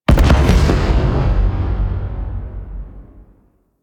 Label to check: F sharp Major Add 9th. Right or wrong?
Wrong